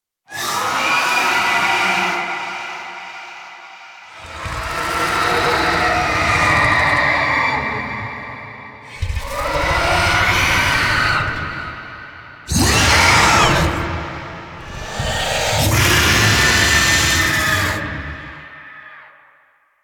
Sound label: Angel Reveal Vocals; Warframe; Zariman Quest